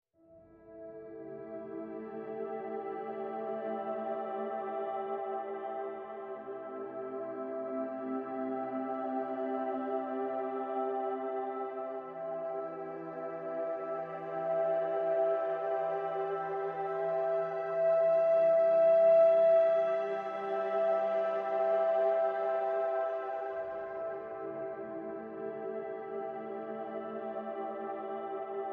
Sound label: Breaking Waves, Ambient